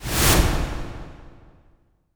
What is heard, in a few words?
IMP WIPE, Cinematic Impact